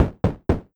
door knock wood synth fast from the other side

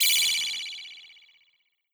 synthesizer

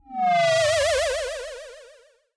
weaken

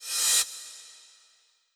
cymbal reverse low

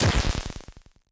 enemy die low

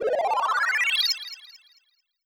synthesizer ascend medium long

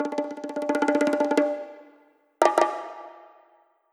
bongo drum low roll high flam x2

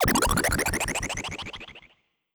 hypnosis

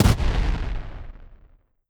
cannon fire

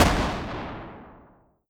gun fire lowbit

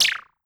standard shot long

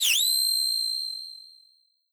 flash

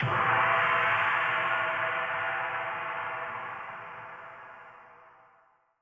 cymbal hit like a gong low

